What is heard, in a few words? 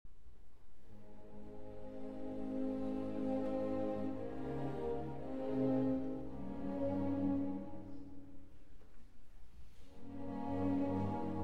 Violin, Cello and Piano; Beethoven; Triple Concerto; in C Major, Op. 56; II. Largo (Live)